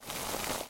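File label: short rustling ringing noise of water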